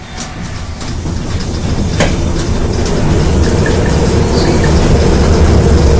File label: elevator start; elevatorparts